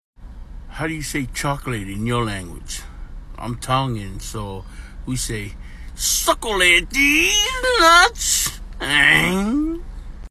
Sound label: aing